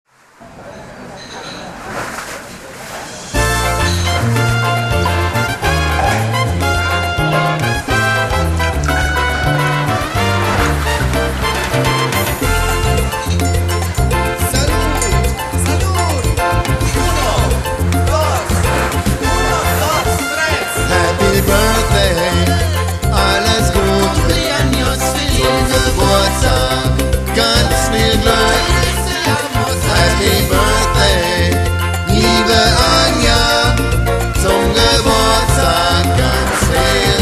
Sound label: salsa, happy birthday songs